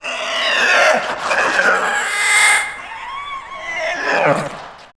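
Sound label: goblin cheer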